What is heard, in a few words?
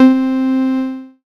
synth c, Euro Style; Best Service ProSamples vol.37 - Dance Synths